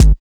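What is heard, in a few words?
Kick one shot; Sonic Foundry (Sony Creative Software); Club Joints and Street Anthems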